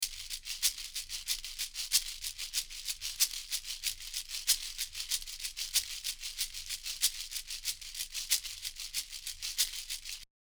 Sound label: DPA 4060 Maracas Percussion